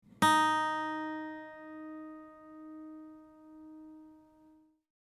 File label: down